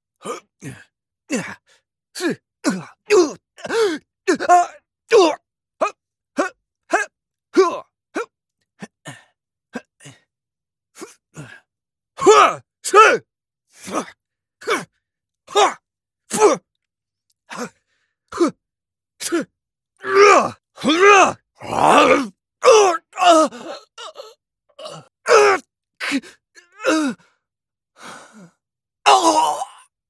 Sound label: gaming efforts